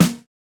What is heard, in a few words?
snare, snare house